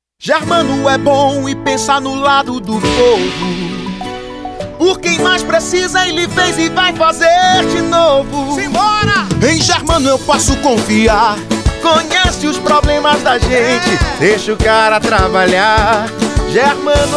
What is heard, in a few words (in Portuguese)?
jingle